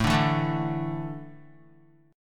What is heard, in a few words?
A Suspended 4th, Guitar; Asus4 strummed; JGuitar